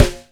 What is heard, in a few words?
bass and tom